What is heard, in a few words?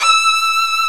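ARCO violin, Solo String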